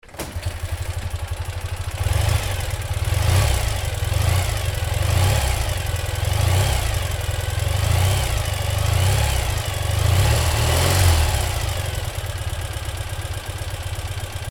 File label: ural side car